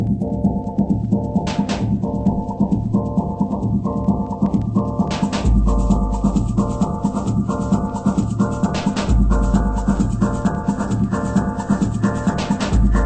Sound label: drum